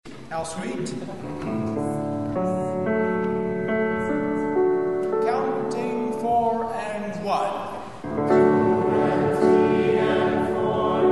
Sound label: Serenade SATB notes